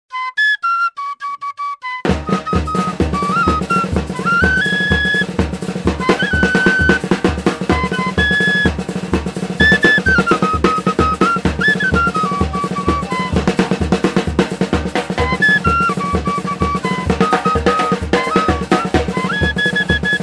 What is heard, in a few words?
Heel And Toe Polka